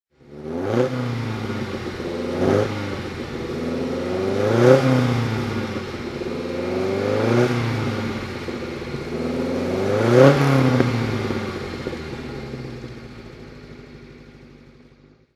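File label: alfa romeo GT 1600 rally throttle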